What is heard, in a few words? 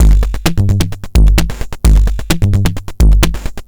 Crushed Beat 130BPM; musicradar